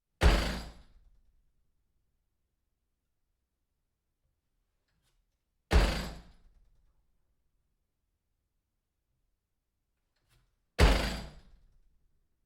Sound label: door hitting backstop far